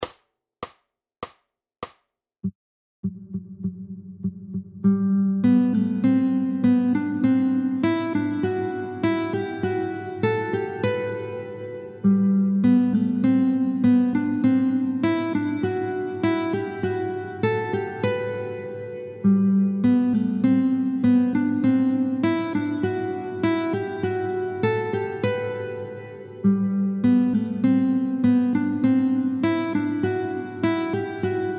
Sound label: Guitar Gym Sequencing oefening